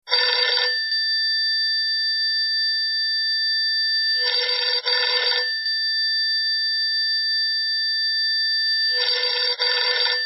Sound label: Warped Ring, Ringtones